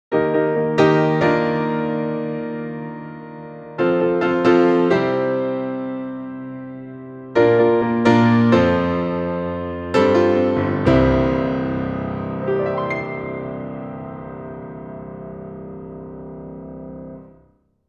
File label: Vienna Imperial, piano